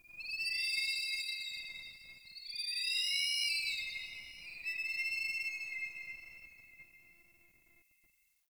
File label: Scream Bird; Warframe; Tau